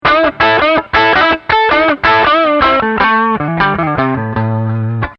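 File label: Solo Overdrive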